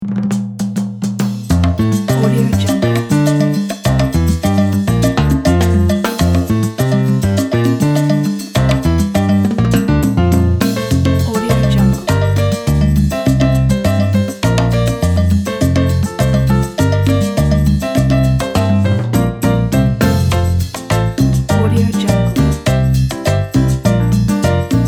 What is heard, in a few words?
uplifting latin salsa